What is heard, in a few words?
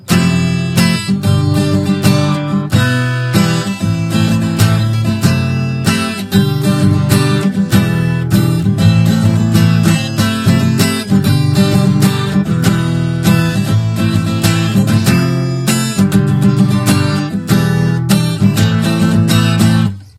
guitar; STALKER